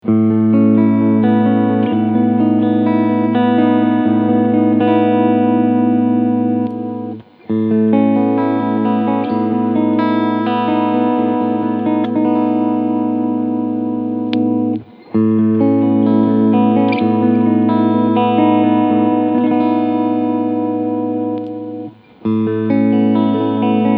Cleansounds Positionen 1-5

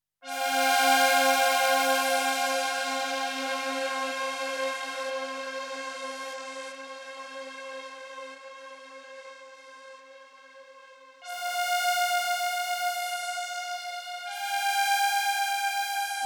Korg Kaoss Replay Asynchrone Loops

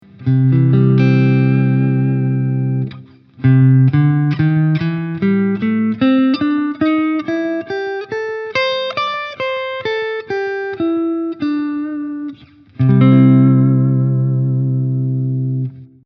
C Dur Pent aufwärts b5

